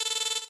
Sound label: incoming; Starcraft